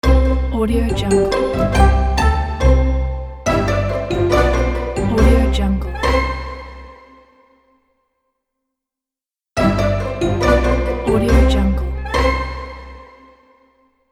comedy show opener logo

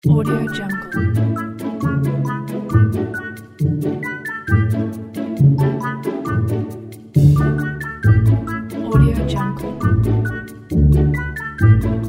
strange childs play

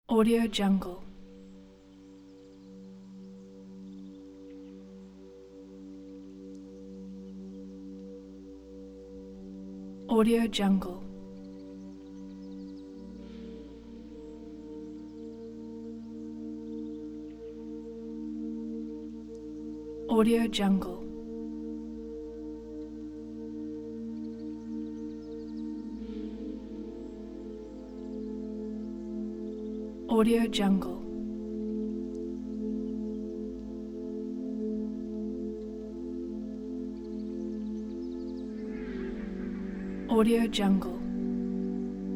harmonic soulful spiritual ambience

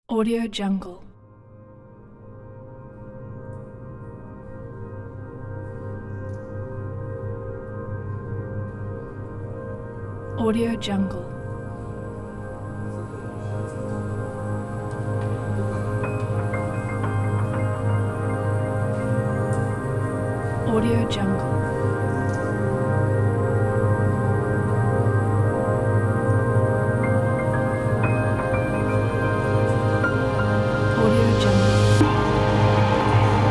ambient soundscape enigmatic

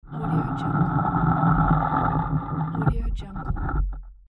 heavy iron rod scraping against iron vice

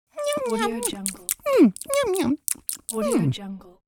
cartoon cute eating yum yum

